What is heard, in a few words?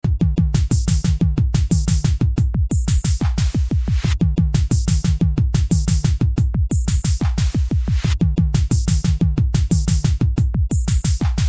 beats